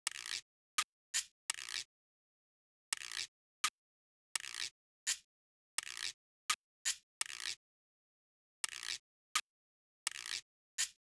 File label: Guiro; Hip Hop